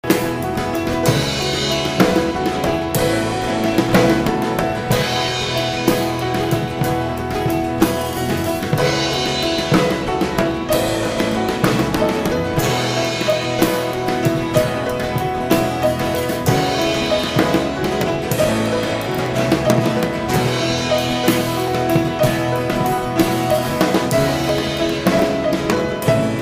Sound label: drum